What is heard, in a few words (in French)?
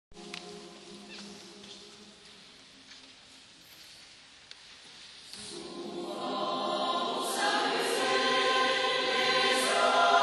ensemble vocal; que notes